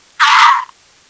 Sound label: pain; csco; csgo; bot